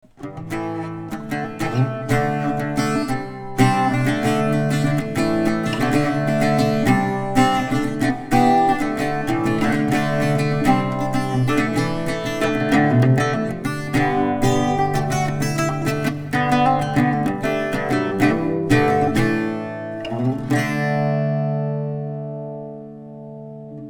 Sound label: bouzouki